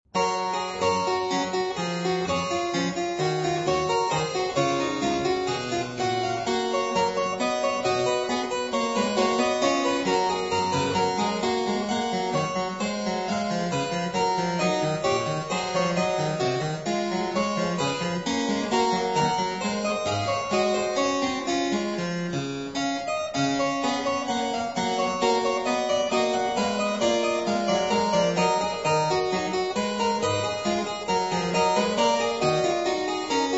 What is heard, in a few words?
Variatio 26 a 2 Clav.